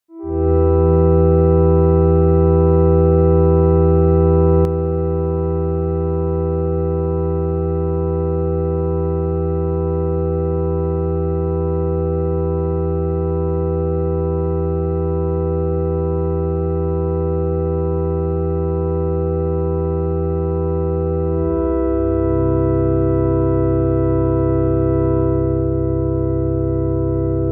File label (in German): Chorus simples Pad